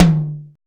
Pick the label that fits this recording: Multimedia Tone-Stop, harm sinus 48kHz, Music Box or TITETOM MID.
TITETOM MID